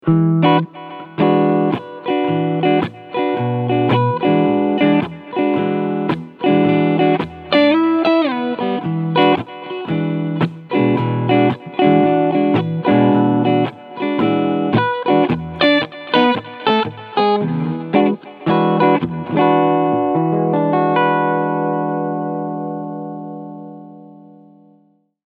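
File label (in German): Epiphone Prophecy LP Custom Clean Sound beide Humbucker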